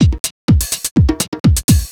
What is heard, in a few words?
HOUSE BEATS 125